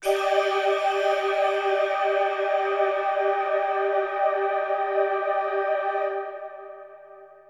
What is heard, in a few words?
synth pad ambient 160 C; Sphere; eJay